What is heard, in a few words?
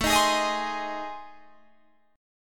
A Minor Major 7th Flat 5th, Guitar Drop D